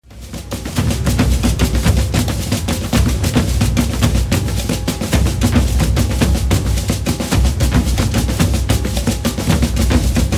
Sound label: maracatu